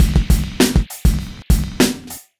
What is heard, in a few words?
gripping, breaks breakcore